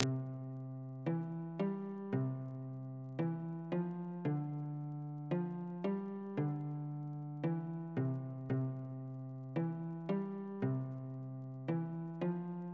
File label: La Vielha Basse; vaqui lo mei de mai